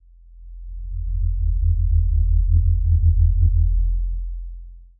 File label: distant rumble a low; approach